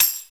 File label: PER TA, Percussion Tambourine